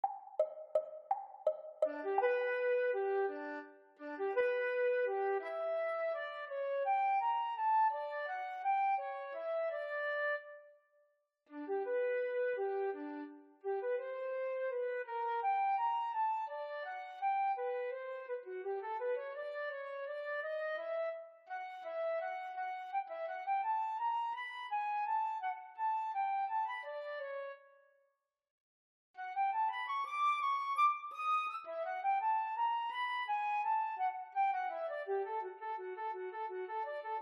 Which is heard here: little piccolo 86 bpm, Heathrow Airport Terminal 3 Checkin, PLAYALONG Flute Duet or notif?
PLAYALONG Flute Duet